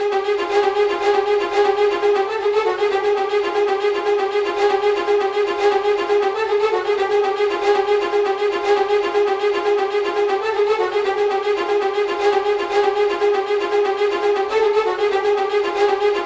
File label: viola; виола